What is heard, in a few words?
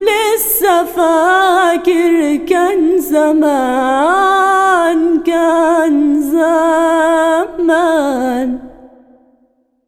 SINGER, Arabian Traditions